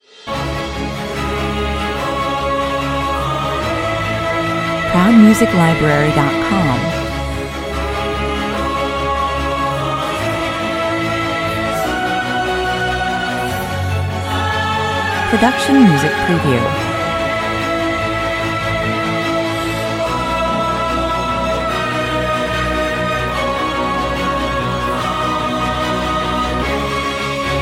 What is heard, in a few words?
no percussion